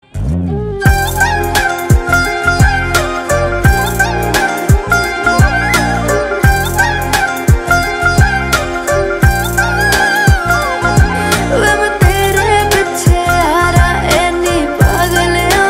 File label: Instrumental Lyrical